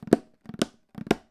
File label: Fill, Conga; musicradar